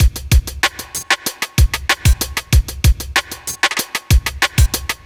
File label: kit; Sound Effect Library